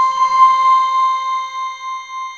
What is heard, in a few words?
marine C5